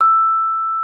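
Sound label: vibraphone E4; Xsample VOL-4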